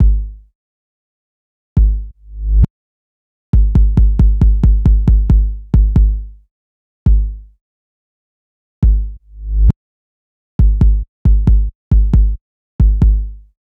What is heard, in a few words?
Kick, 068 Bmin, Drum Tracks